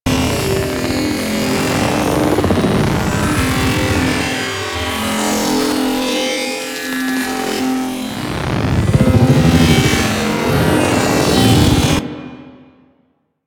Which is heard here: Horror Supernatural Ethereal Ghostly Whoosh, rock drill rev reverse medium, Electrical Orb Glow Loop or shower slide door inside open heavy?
Electrical Orb Glow Loop